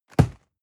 box hit hard rustle

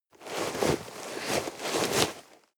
nylon leather walk

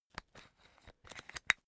umbrella telescopic extend slow

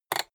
rotary control short fast turn hard

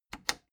plastic button latch loose click disable fast

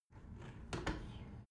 window open tilt heavy sequence

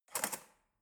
cutlery fork drawer handle soft

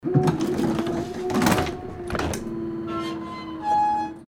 tram door closing

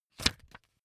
magazine flip forward fast

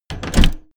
train intercity train door close

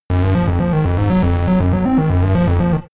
game arcade underworld

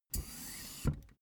office chair hydraulics down

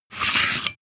sword scabbard handle pull

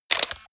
camera

